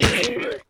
Monster Death

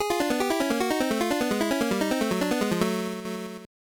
Jingle, monopoly gbc

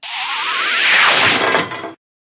ninja star approach

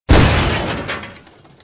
boss Buggy Damage; Angry Birds Space

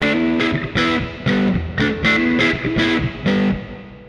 Guitar wet

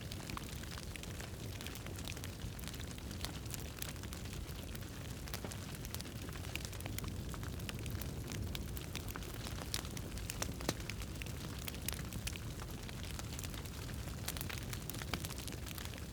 Alter Fire; Devour; The Town

